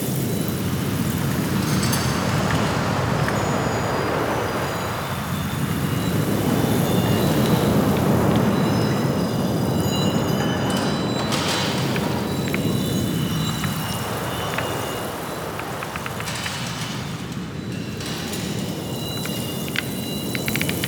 Witch Station Bed Loop; Ambience; Soul Plane

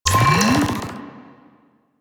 Sealed Cache Terminal Wake; Warframe; Zariman

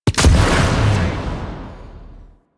Pylon Explosion; Enemies; Weapons; Warframe; Corpus; Arachnoid Camper

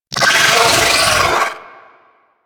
Amalgam Moa Satyr Vocal Death; Warframe

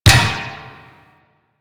Ball Bounce Metal; Warframe; Missions; Tenno Ball

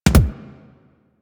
Weapon Punch Double

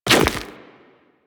Run Goo, Footsteps; Warframe; Tenno

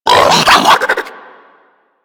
Infested Biped Flip; Warframe; Moa

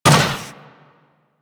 Moa Combined Step Default, Footsteps; Enemies; Warframe

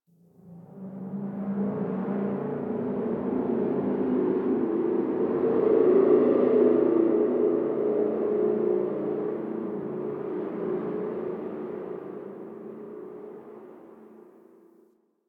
Dark Wind

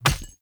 Grunt Death Default; Sinecure; Melee Grunt; Enemy; Death Effects

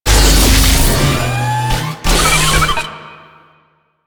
Turret Minigun Deploy; Warframe; Zariman

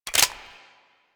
Heavy Weapon C Clip Out; Warframe; Heavy Weapons

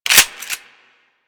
Grenade Launcher Reload; Grineer

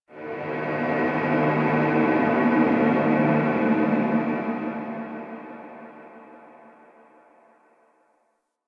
Crp Ship Energy Distant Texture Large; Ambience; Warframe; Corpus Ship Remaster

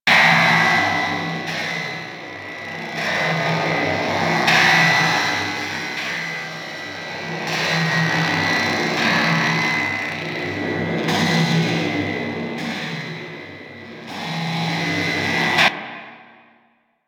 Rail Jack Ambient Machine Loop; Warframe

